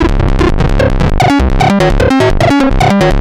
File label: Atonaloop, Industrial Analog